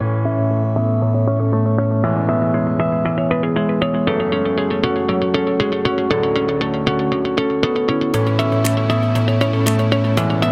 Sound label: Professional